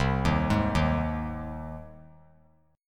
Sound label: C Minor Sharp 5th C 3C,3F,3A,3D; arpeggiated; JGuitar